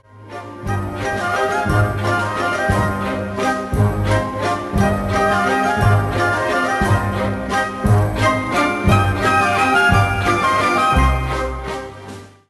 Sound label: Waltz